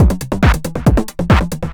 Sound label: percussion loops, Techno; PS-45 WAV Techno ID